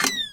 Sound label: Night Vision OFF; Five Nights at Candy's